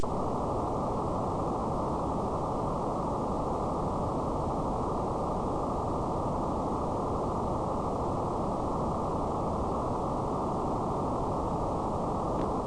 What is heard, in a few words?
signal